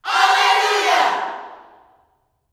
"Allelujah", Vocal Gospel yell shout; Spectrasonics; Vocal Planet Vol.1 Gospel; akai

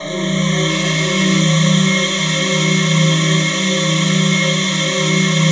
ATMOPAD; Best Service; XX Large; Pads

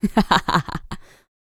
LAUGHS, Jazz Fx

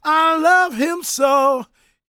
GOSP MALE, Vocal Planet Gospel

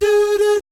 "DOO DOOT", vocal jazz fx

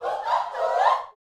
shouts, gospel; Spectrasonics; Vocal Planet Vol.1 Gospel; akai; UYC SHOUTS

